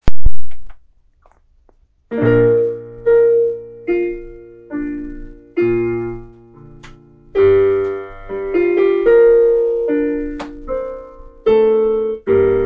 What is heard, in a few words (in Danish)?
2. sopran m. akkom; Ave Maria